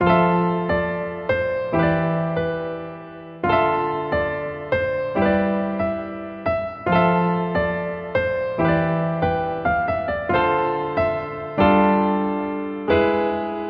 Piano Loop, 140 BPM A Min; Piano Loops; Future Bass; Instruments; Scarlet

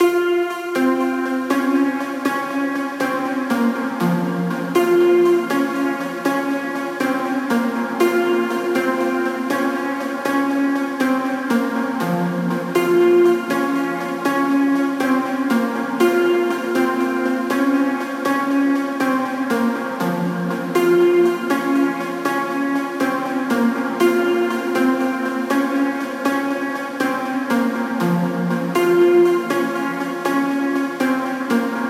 Synth Pluck - Bbminor 60BPM; STEMS; Ghosthack; SkyRealm; Mood Kits; Mood Kit 07 - All Is Not Lost

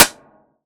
Clap, Synthesized; One Shots; Ghosthack; Drum Hero 5

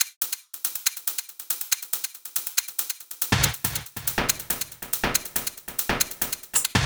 Top Loop, 140; Loops; Dejection; Ghosthack; Drum Mega Bundle Demo; Abstract Percussions Volume 4

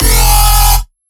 Bass E Groan, Dubstep; Bass One Shots; Groan2; Ghosthack; Dubstep Sound Arsenal; 50 Bass One Shots

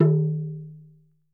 Talking Drum - D#; percussion; Cymatics - Infinity Beta Pack 2.0; Zeus Percussion Collection

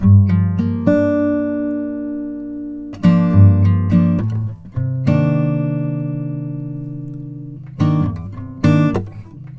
Guitar Loops 100bpm Gmin; Guitars; Xmass; Ghosthack; Advent Calendar 2019

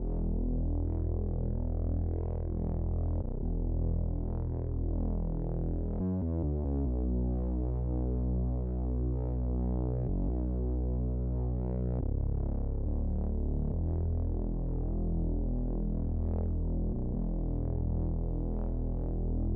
140 BPM B Min Bass, Trap; Melodies; Loop Stems; Cymatics; GHOST (Beta Pack); Arcadia

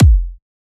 Bottom Kick - F; House; Drum One Shots

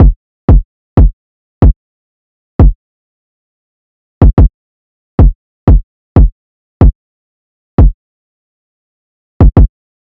Drum Loop 185 BPM Kick; Drum Loops; Loop Stems; Cymatics; Mystery Pack Vol 4; Stomp It Drum Loop

